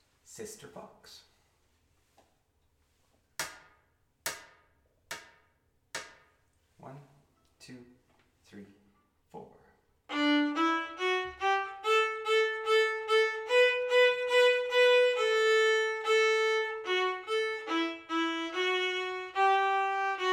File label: Vln Vla